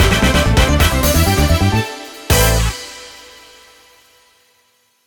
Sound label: catch; meteor world actor